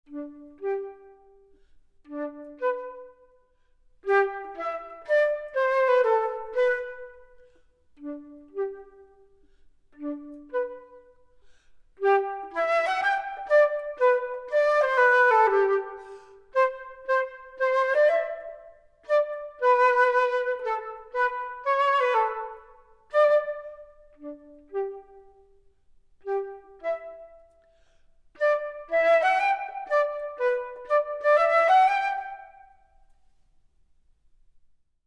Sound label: performance Flute; Nightingale; ABRSM